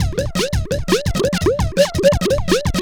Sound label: Bloops 170, Drum&Bass Breaks; EZ Rollers